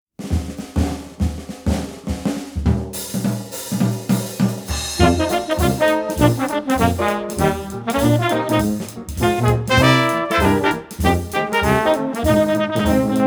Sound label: Clown Drum